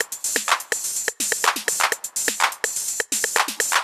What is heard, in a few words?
drum transit top 125, Drum Loops, Classic Chicago House